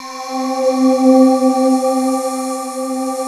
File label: SPC PAD C3, D70 SYNTH; East West; Global Sounds